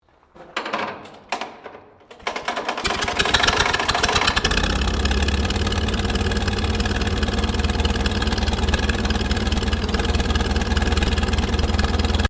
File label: Tractor McCormick Deering W12 1937 startup